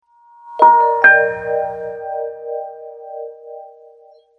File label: ping; Samsung Galaxy S Fascinate SCH-i500; USC